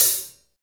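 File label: HH, hi-hat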